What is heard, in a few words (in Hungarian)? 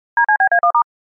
Roman adas bekapcsolo Jingle; Nagyvaradi atvalto